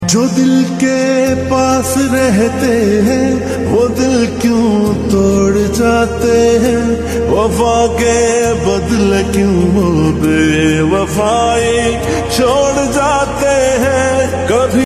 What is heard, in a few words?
Ringtone Male